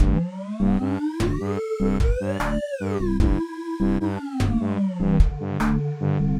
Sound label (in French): menu principal; Tyra